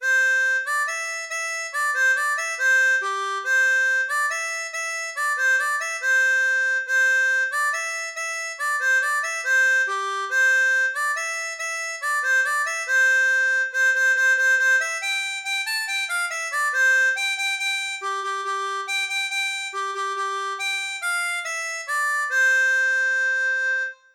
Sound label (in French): Alouette, harmonica; Comptines